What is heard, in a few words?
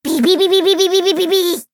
applause